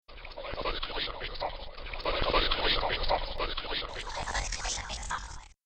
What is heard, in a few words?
whispers